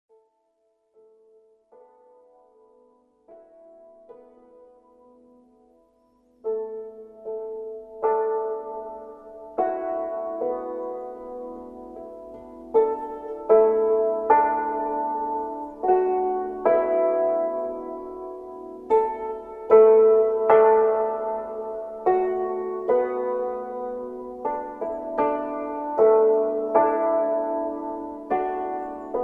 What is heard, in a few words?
Music for Clouds solo